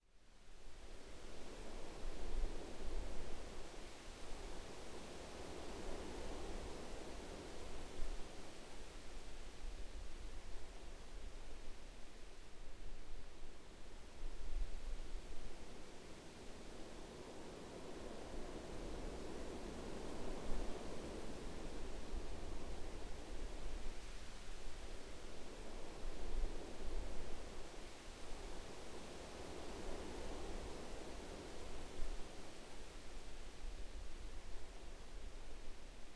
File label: Plains Bed Lp, Ambient; Civ4